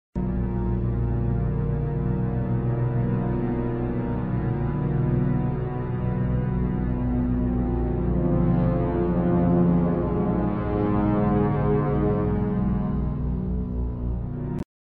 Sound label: Ambient Sniper Theme; SWAT 4